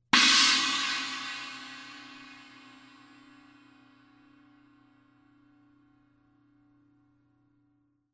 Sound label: S China Inverted Crash, cymbal sound